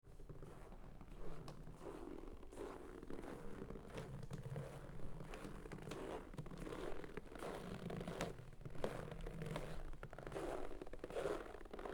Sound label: footsteps snow; foley